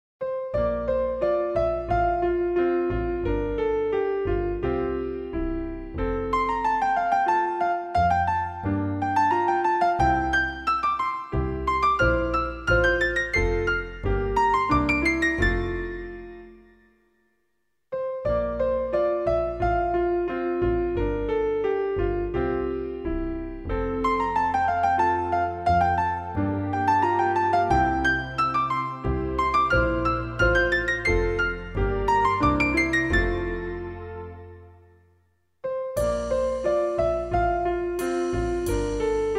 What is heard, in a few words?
Instrumental; Campeon di lus